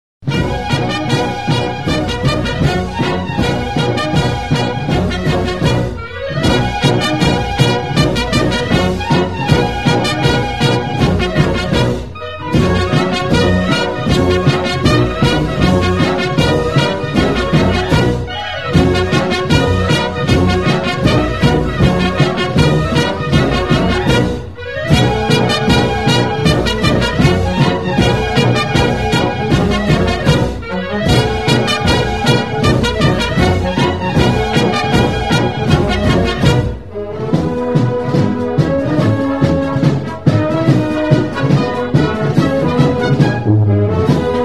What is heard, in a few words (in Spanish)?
diana, corneta